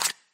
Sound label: card slide